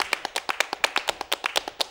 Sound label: MULTCLAP, MULTICLAPS; Best Service; World Colours